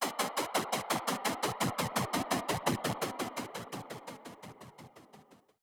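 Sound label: alarm siren, modulation processed, 170bpm; musicradar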